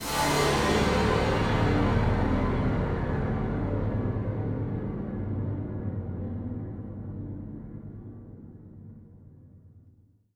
Tonal FX D

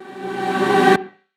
Synth Shot; Vengeance Samples

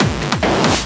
Fill 140 BPM

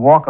Vocal Oneshots; Vengeance Samples; Vocals

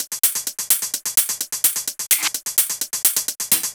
Vocoder Loop, 128 BPM; Vengeance Samples; VTDS2 Song Kits; Vocoder Mother Earth

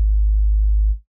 kik; Gangsta Sound Kit

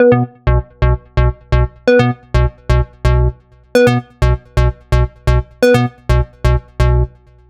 Melody 128 BPM Root B; Vengeance Samples; Melody Kits

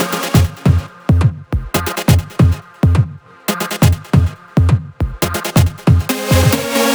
138BPM Myst Melody

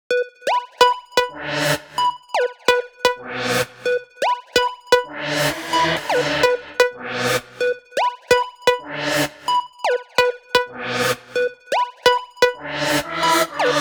Melody Kits 128BPM Root B; Vengeance Samples

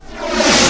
Short Fills & Reverse; Vengeance Samples

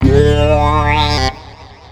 gte 125 d, guitars; house; Vocal House; PS-28 Vocal House Samples

